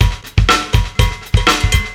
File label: live beat; Sonic Foundry (Sony Creative Software); Ugly Remnants VOL-2